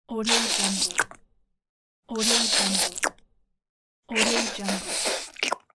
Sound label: cartoon lick